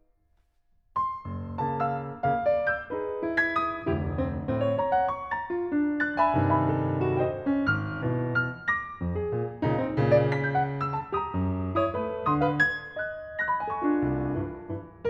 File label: Waltz; babbitt